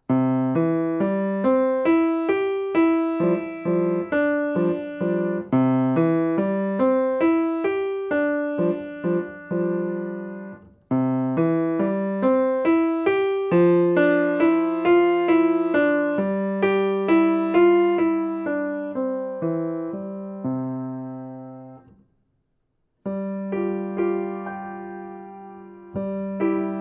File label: Waltz